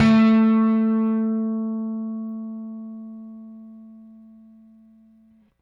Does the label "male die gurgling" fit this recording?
No